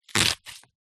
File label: leek slice knife on wooden chopping board